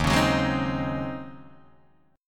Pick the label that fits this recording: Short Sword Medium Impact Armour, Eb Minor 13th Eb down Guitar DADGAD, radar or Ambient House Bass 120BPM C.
Eb Minor 13th Eb down Guitar DADGAD